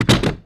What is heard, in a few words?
drone set; armie